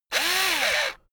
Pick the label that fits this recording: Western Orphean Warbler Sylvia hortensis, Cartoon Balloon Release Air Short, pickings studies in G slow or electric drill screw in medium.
electric drill screw in medium